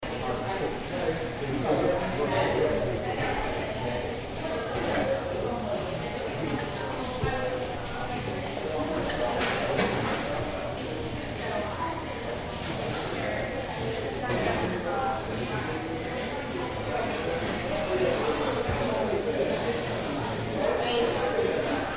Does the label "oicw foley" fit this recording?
No